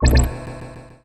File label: interface press, gamebuzzer; sloodle; gaming